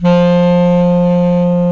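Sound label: Bass Clarinet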